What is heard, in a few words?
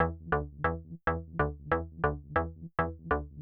140BPM Bassline DRY, Eminor; Vengeance Samples; Selection Kit